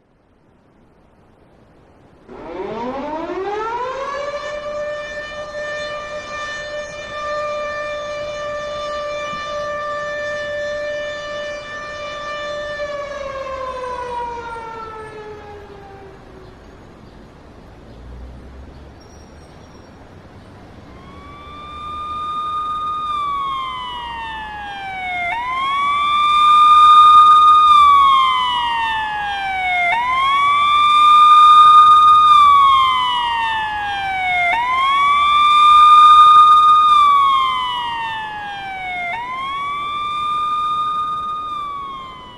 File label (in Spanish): Alarmas y sirenas; Efectos de sonido